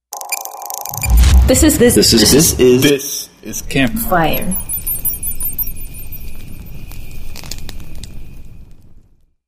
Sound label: Campfire Audio Logo